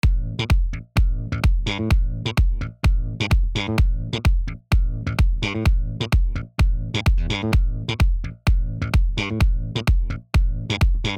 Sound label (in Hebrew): אחרי eq; טיפים מהסטודיו 3 אקולייזר eq ומיקס בסיסי